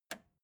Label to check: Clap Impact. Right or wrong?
Wrong